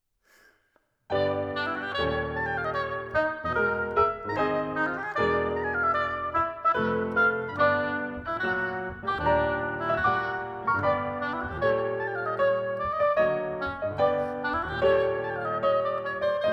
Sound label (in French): Piano Hautbois introduction